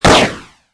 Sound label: retro gun shot